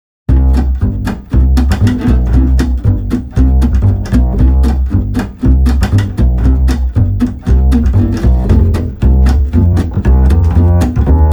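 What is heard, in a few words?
Street Guitar Bass Old Time, 117 bpm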